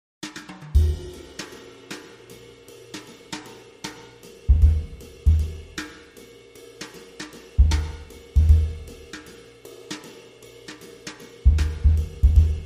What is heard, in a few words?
Brushes